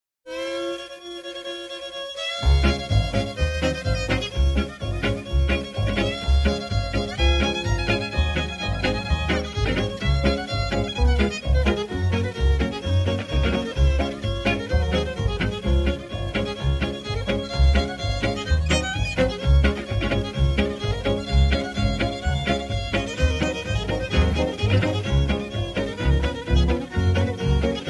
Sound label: pine tree stomp, square dance music